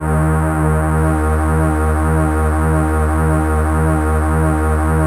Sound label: SYN, ENSEMBLE